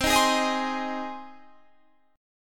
C Minor Sharp 5th C Guitar Standard; strummed; JGuitar

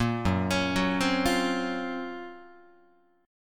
Gb Major Sharp 11th Gb Guitar; arpeggiated; 1,2,4,4,4,4; JGuitar

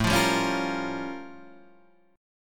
A Augmented 7th Flat 9th A; strummed; 1,3,sharp5,b7,b9; JGuitar

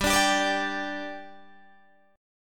G 5th G Guitar DADGAD, chord

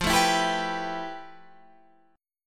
F Major Sharp 11th; strummed

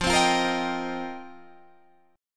F 7th Suspended 2nd Suspended 4th

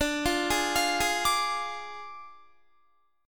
D Diminished D, Guitar; Ddim arpeggiated; 21,20,20,22,20,22

